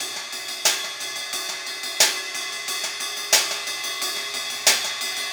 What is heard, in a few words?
Cymbal and Hi Hat